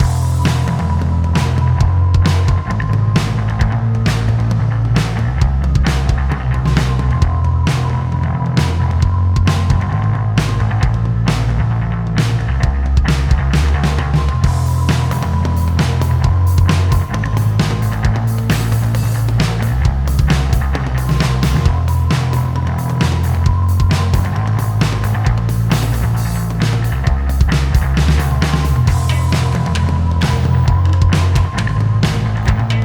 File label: music shark theme